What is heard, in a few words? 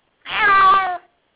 cat meow